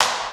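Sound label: snare drum, Drum & Bass, 160 BPM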